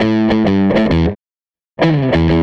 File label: SG Guitar Loop